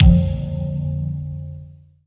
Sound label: kick bell, dance kicks; Roland L-CDX-01